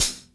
soft hitwhistle; osu!

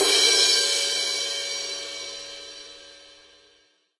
normal hit finish; osu!

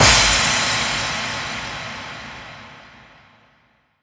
normal hit whistle; osu!

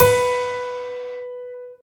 soft hit whistle; osu!

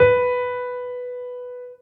normal hit whistle; osu!

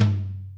drum hit clap; osu!

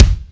normal hitnormal; osu!

